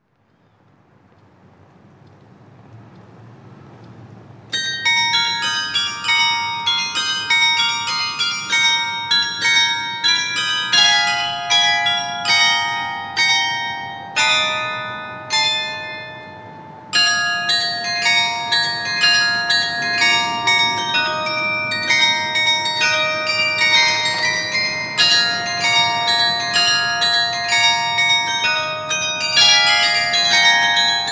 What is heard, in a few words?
carillon